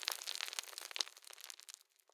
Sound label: rockfall; ambient; dust2